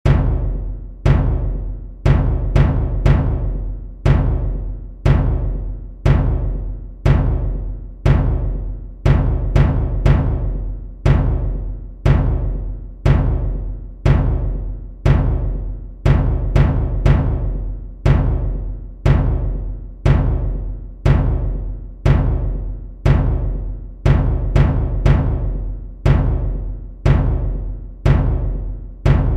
Bombo

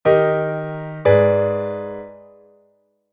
cadencia mal; armadura 5 sostidos, dous acordes; re-fax-la-re; sol-sol-si-re becadro

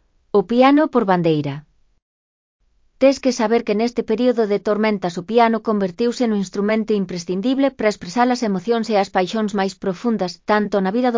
O PIANO POR BANDEIRA

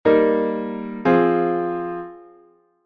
rota en LAb M; Cadencia Rota

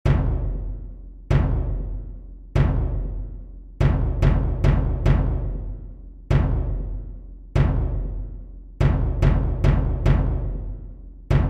Xota Bombo

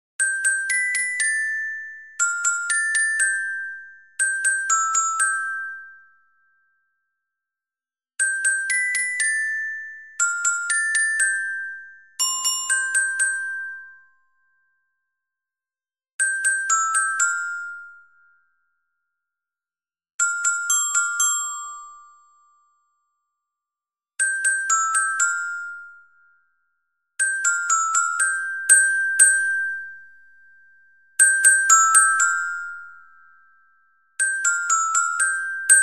Carillon Soprano

